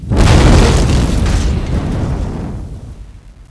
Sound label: Alien Generator Explode; 1997 MMX LAN PACK (win 95); Army Men